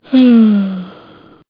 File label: sigh